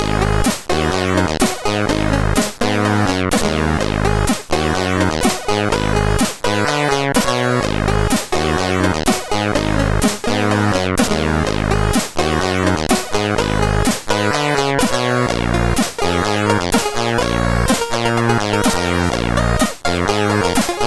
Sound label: Note Tune, CSG8580R5; HCL; hvsc